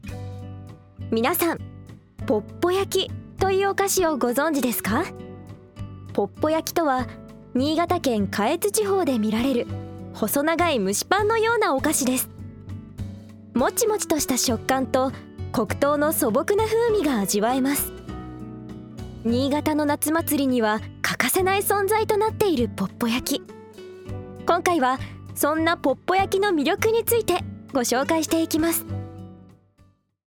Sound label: BGM